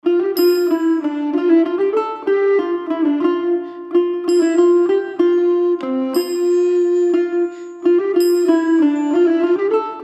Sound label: Estampie; Durandus 6th Estampie